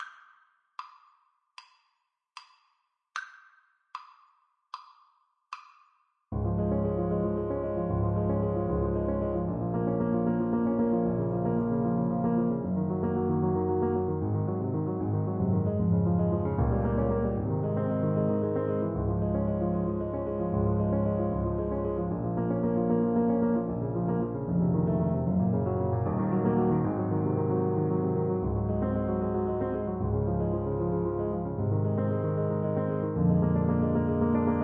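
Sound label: Schubert Op90 No3 alto saxophone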